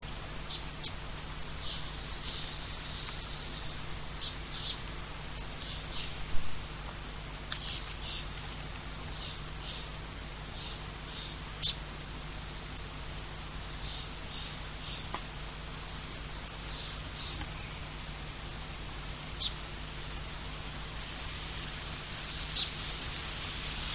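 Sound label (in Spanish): araçarí chico, cantos, aves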